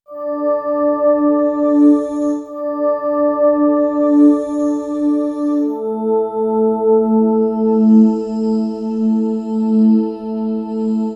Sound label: Ambient Keys, Cinematic Funk; Sonic Foundry (Sony Creative Software); Cinematic Funk Urban Tone Poems